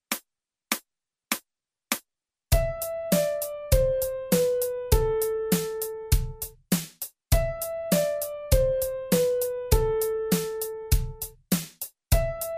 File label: Piano